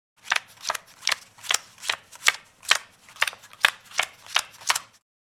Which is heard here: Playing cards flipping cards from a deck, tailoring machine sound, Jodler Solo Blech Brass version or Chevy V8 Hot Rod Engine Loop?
Playing cards flipping cards from a deck